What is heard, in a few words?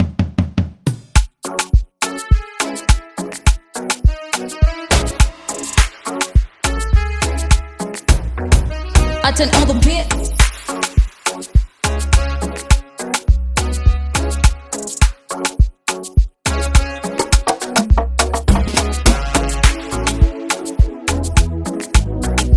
Instrumental